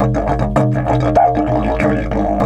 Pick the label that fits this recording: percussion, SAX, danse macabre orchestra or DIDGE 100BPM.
DIDGE 100BPM